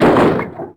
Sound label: metal; WAR